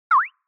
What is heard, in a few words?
Anime Motion Dry